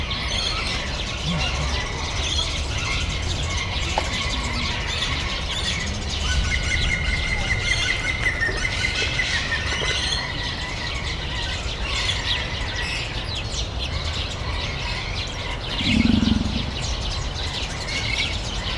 előbb iszik, utána mormogó hangot hallat, emu; debreceni zoo 2012

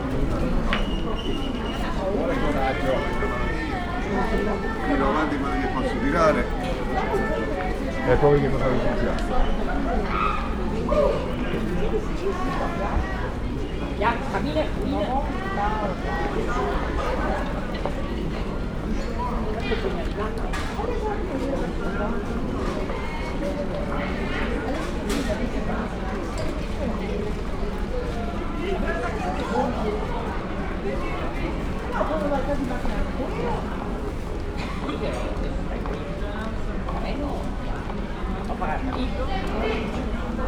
gyorsetkezde elott, uzlet; velence; olaszorszag 2014

